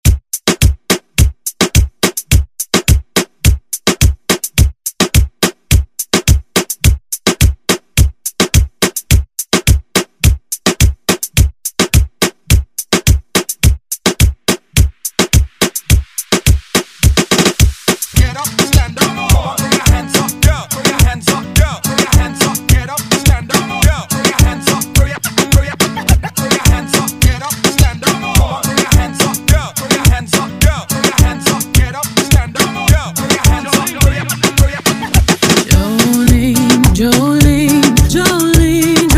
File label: Reggaeton Hype Redrum